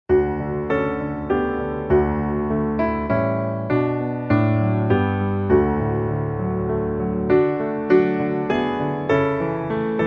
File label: piano